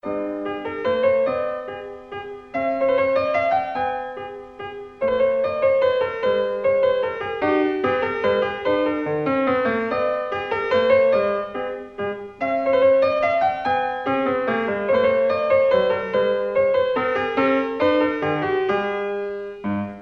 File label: Minuet in G